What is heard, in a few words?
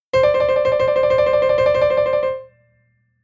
grace note